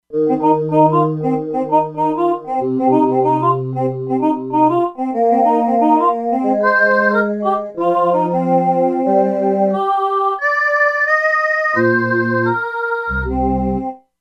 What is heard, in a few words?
Electrovocal, E-mu Proteus-2; Factory Presets